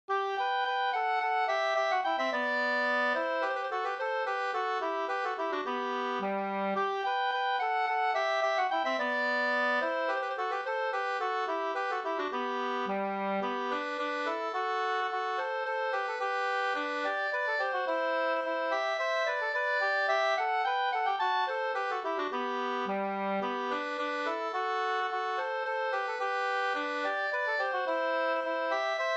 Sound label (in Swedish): slangpolska